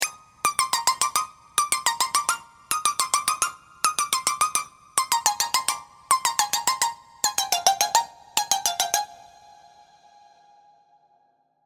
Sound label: serum plucks; chiqui audio